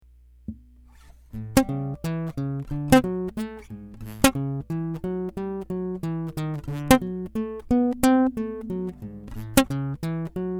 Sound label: Bass guitar blues